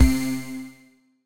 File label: ready; Steven Universe - Beach City Drifters